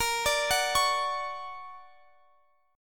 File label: D Augmented Major 7th Bb, guitar